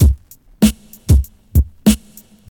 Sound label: 97 bpm 2000s drum beat c sharp key, kick tuned to the C# note